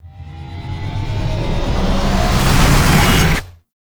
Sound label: Unholy Punch Launch Riser; Enemies; Abilities; Warframe; Tau; Roathe